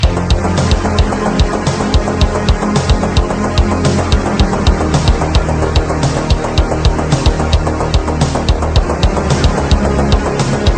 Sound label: Synth Mix; Terminator